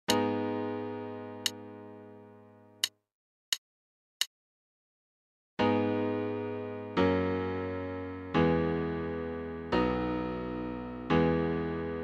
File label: taiko